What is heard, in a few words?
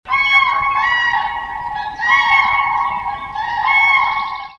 crane, Nature, Forest; AuExtraForest2